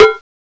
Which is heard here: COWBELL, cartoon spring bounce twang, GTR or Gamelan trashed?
COWBELL